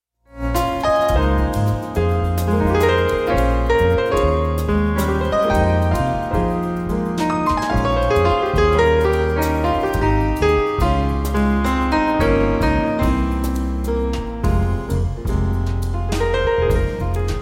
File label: menuet from sonatine arr for piano bass and drums; maurice ravel; david rees williams